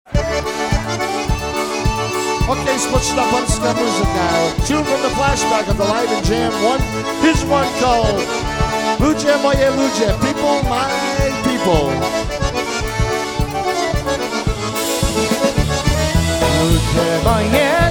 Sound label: Polka Medley, Live Jam